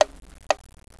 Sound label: Tick-Tock Loop; Sam Rupert